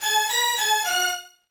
Beat

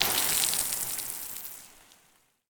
liquid

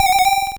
elephant bells; gamerip; earthnight

